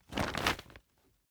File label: camping chair pack up hard